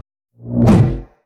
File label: Beam Mace; Weapon